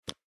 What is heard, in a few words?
card sound